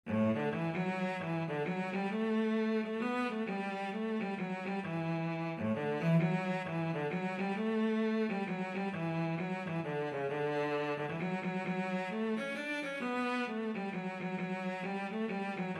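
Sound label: violoncello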